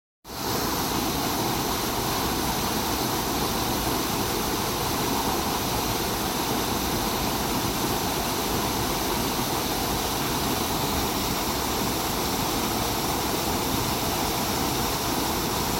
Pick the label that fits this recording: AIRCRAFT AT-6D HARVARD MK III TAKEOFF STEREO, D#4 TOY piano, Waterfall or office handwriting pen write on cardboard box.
Waterfall